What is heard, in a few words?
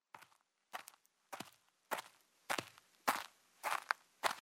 Footsteps; cinematics; Age of Empires III - Complete Collection; Microsoft Studios